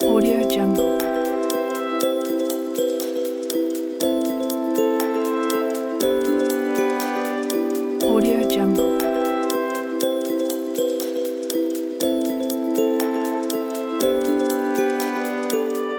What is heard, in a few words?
thinking time loop